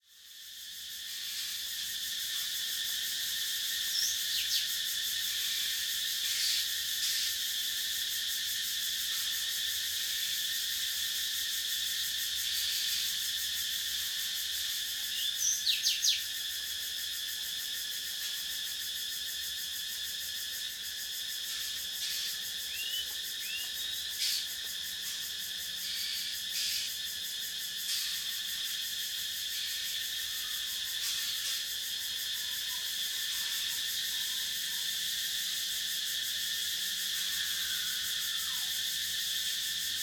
Forest cicadas insects and birds at night, Thailand; Charlie Atanasyan; Thailand sound library by Faunethic